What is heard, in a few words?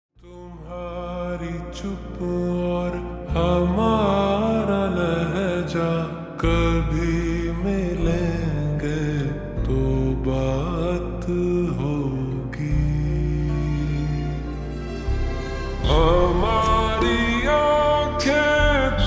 Slowed Reverb Ringtone